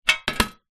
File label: household metal incense burner place down